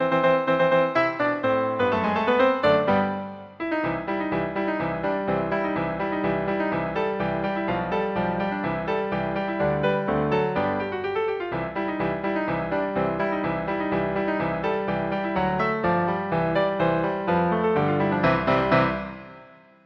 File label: radetsky march, piano duet